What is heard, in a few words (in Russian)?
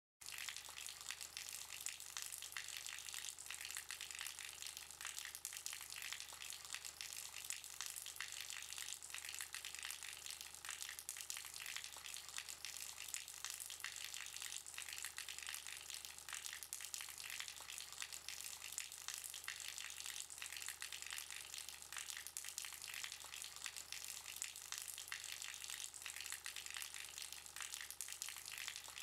zvuk liush vody na zemliu